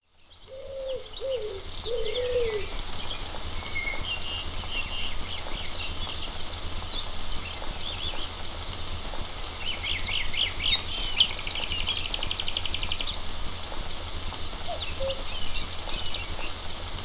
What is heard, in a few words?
Cuckoos in the rain